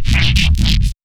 neuro bass compress

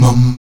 VOX MUM B 2B, Afro Chants; Roland LCDP11 Africa VOL-1; Stacc Mums

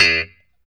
POP E2, STRAT guitar; Spectrasonics; Hans Zimmer Guitars VOL-1; JC-STRAT POP

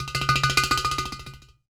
UDU, Supreme Beats - African Contem; Spectrasonics; SB Udus

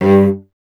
STR VC G 2, Cello; Miroslav Vitous; Solo Instruments VOL-1

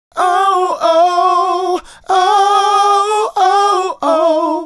Male Group, Vocal

